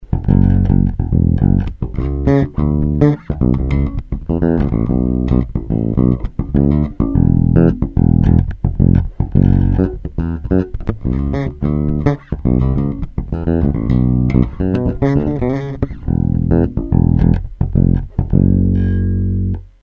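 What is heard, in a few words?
fender badass groove, bass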